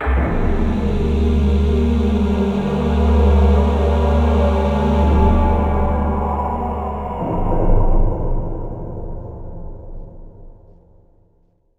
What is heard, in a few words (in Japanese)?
ゲームオーバー; Kakugo no Susume; PS1; Game Over